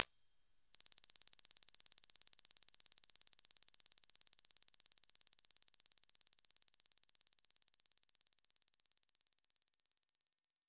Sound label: DX7 Strike